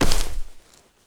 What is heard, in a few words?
slide floor